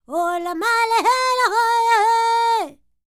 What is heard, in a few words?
Vocal Planet World Voices, NOMADIC